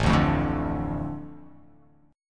A Suspended 4th Sharp 5th

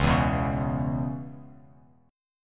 C sharp Minor 7th C sharp, chord; C#m7; strummed; JGuitar

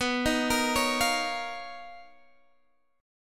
B Major Sharp 11th, B, down, Guitar Standard; arpeggiated; JGuitar

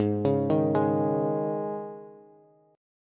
Ab Suspended 4th, Bass; Absus4 arpeggiated; JGuitar

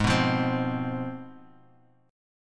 C Major 7th Suspended 4th Sharp 5th, chord

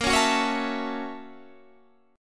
Gb 7th Sharp 9th Bb, guitar

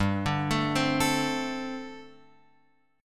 G Suspended 2nd Suspended 4th, Guitar Open F; Gsus2sus4 arpeggiated; JGuitar

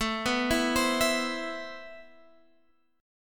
C Major 7th, A, guitar chord; Cmaj7/A; arpeggiated

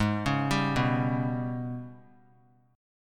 G sharp Minor Major 7th Double Flat 5th; arpeggiated; JGuitar

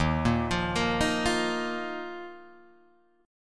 Eb Suspended 2nd Eb down Guitar Open G

